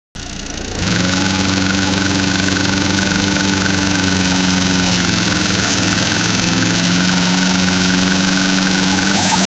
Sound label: create a v16 engine for, hypercar